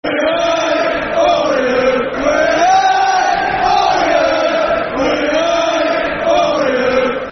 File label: Football Chant (Soccer Songs); We Hate Orient; Southend United Sufc